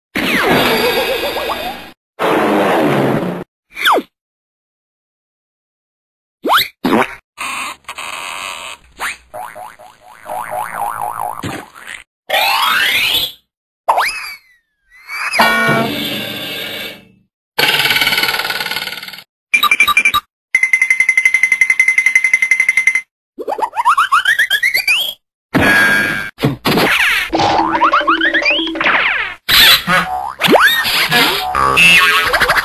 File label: Cartoon Sound Effects